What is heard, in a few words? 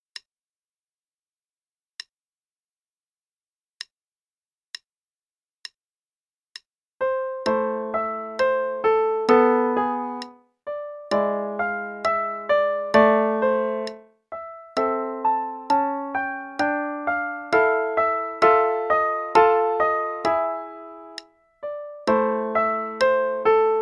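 Play-a-long, Adagio, quarter note=66; Steibelt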